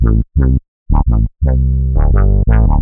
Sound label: Bassline 85 A, hiphop, 85bpm, Bass; musicradar